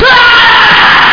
SCREAM

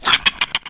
bug effort; Severance

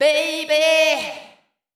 VOICE GRL HOUSE 125, House Voice; eJay

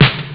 blash, drums snare; OctaMED SoundStudio V1

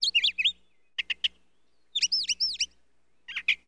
thrasher, ambients

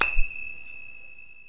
coin; SOCDEMO

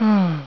SIGH; baldies

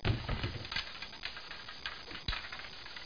DROP CUE; 10,000 Graphics Pack Volume 1; Media Graphics International

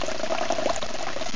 WATER; aantics

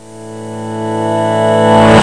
Exit Music; Zone Warrior

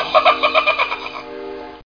cackle

